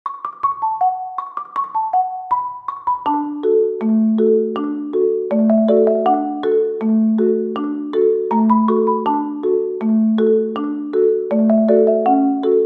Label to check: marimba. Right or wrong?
Right